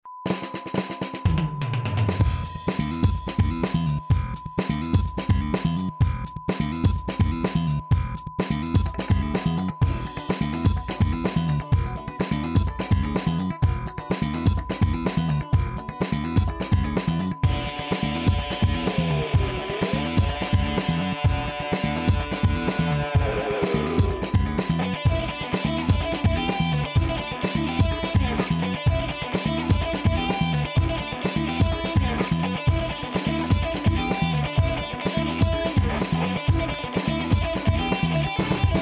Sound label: music on guitar